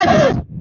the cough

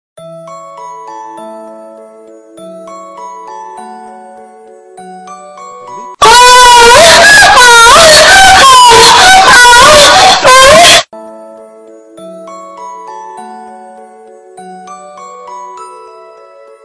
jingle bells; nova geracao

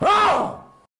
man dog bark

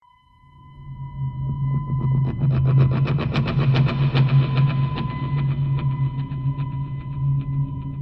subalert

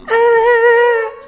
ahh

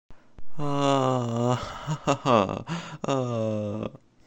ahhhhhhhhhhh ah ah ah ah hhhhh

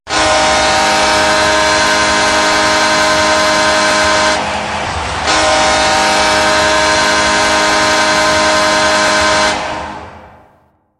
explosive hupe

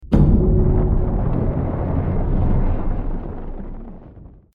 sunk sound; sea of thieves

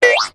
boink; lost effect

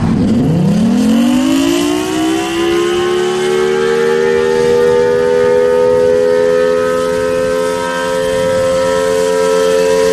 thunderbolt 1000t noon whistle, tornado siren

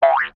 boink in not scary

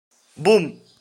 boom, boom gun shot

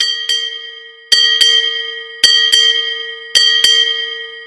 interval bell

